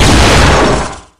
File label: instagib; scmod; ut99